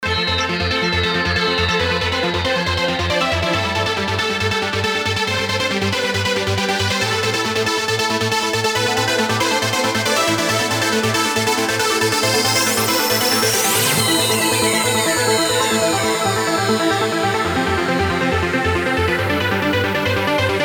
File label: techno